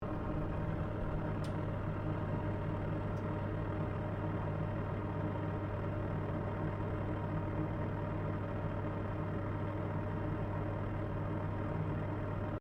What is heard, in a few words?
FAN OVEN EXHAUST LOW LOOP; Various fans; Electronics